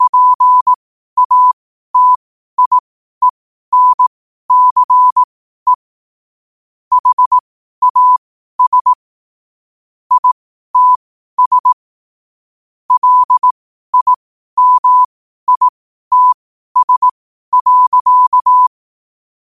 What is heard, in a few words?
5 wpm, morse